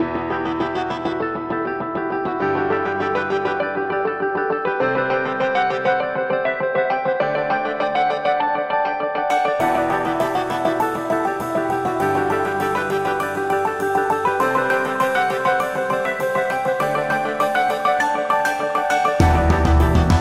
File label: Short Epic Trailer, epic music; epic music vol 1